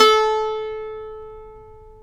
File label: SAZ A 4; Spectrasonics; Hans Zimmer Guitars VOL-2